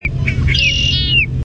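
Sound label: Red-winged Blackbird song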